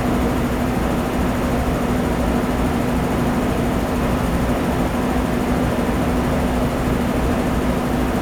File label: portable scrubber; Ambience